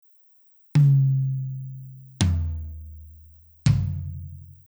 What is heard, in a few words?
tom